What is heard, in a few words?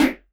Elektron Monomachine, Drum Machines